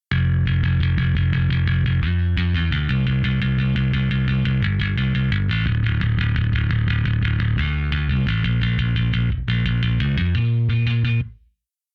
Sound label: bass on